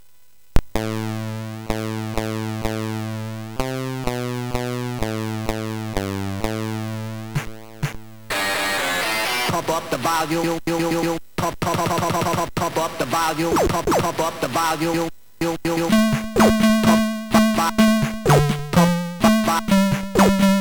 Drunken DJ, sid MOS6581R4; Sanden Peter

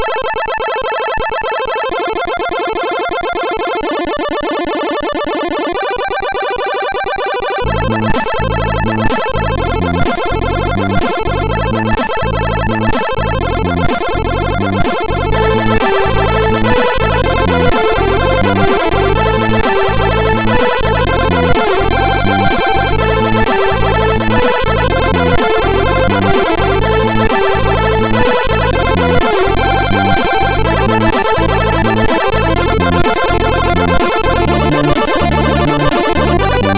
Lame Over tune, MOS6581R4

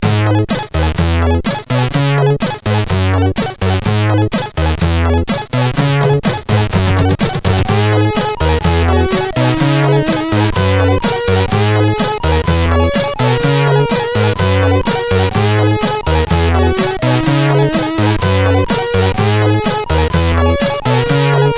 Shortone, CSG8580R5; hvsc

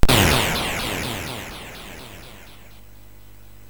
Bomb Chase Revival SFX, sid MOS6581

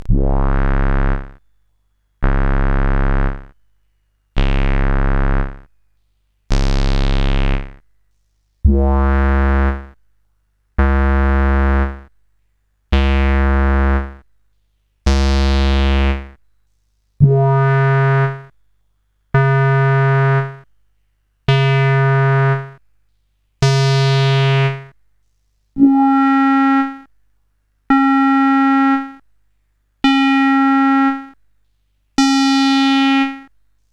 Synth Samples Bass, CSG8580R5 sid

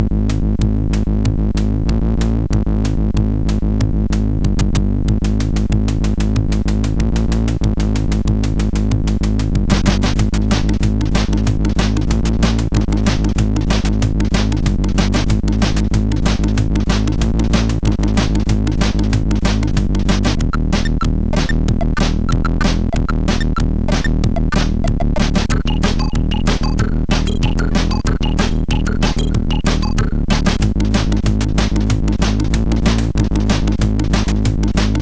Hard Tekkno, MOS6581R4; HVSC

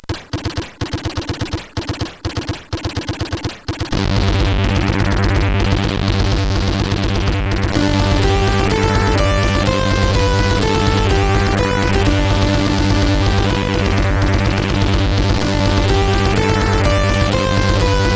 War Music, CSG8580R5

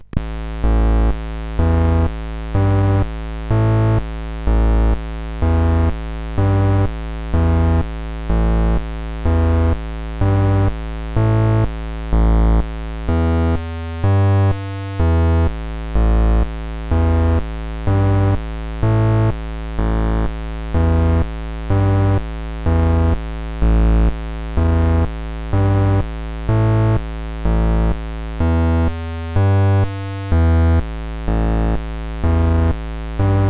intro, sid CSG8580R5

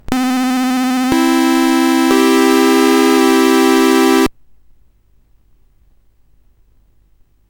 sid CSG8580R5; Jumpman

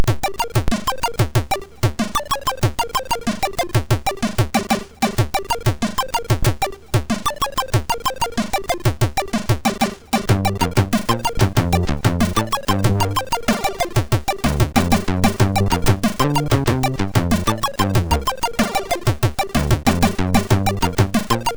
Acid, MOS6581R2

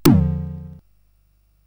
sid CSG8580R5

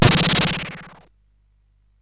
SFX; Narco Police

